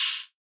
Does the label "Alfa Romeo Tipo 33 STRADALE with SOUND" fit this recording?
No